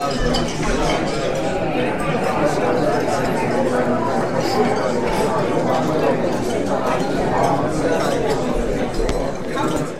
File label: Restaurant noise